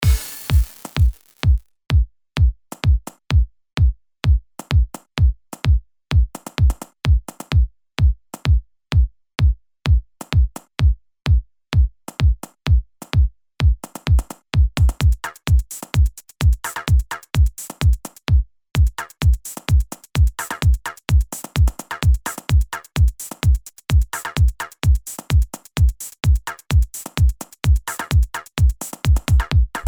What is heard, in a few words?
funky noise